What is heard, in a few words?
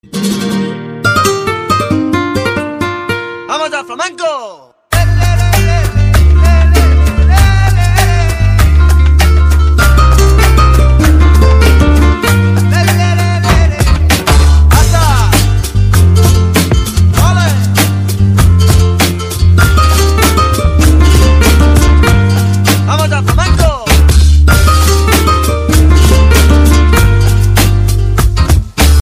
nokia spanish, ringtone